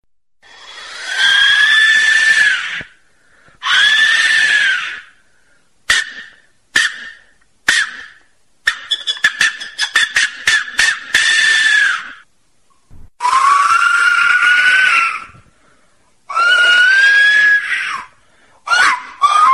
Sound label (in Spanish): ruidos